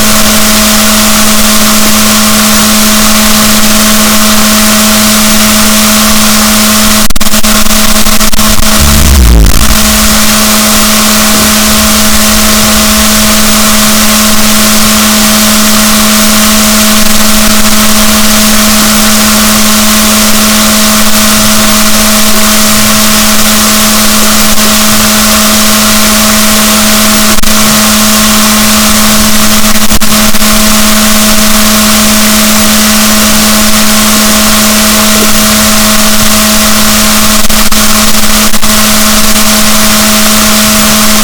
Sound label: rorquals negatif, boat; Balaenoptera Physalus Chile